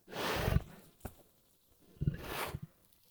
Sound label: Wolf Idle Grunt; Animals; Vocals